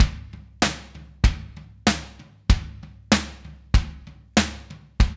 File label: DRUMS, Funky Ass Loops; East West